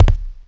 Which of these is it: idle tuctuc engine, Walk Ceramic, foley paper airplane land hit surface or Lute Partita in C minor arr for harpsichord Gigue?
Walk Ceramic